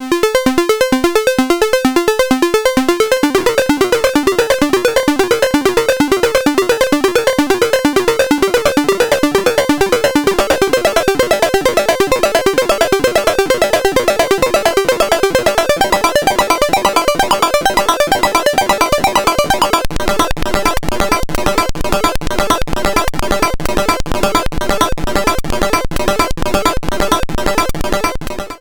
ACL Multi Function Discrete VCO Sync

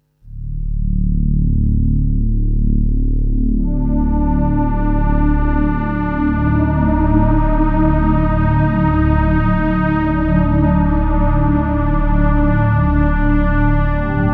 String

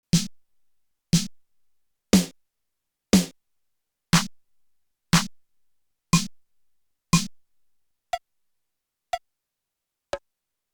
Snare Sounds

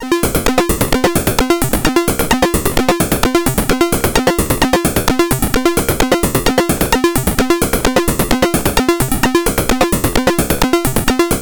Discrete VCO Sync CV EXP FM; ACL Multi Function

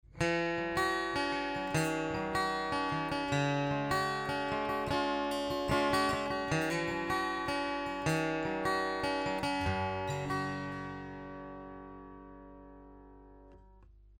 114ce DI arps; LTD

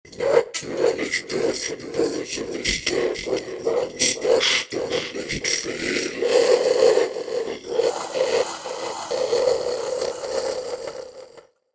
Waves Ovox

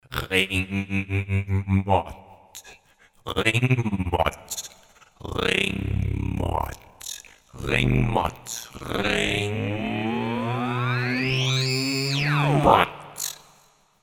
ringmod; tc helicon perform ve

